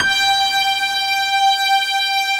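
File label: G 5, PIANO STRINGS; Best Service; Advanced Media Trax Modern Composer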